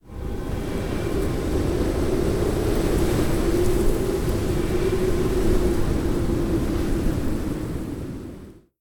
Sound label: lobby wind; smite; tiamat